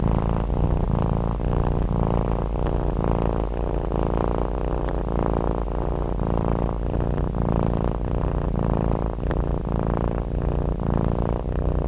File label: Purr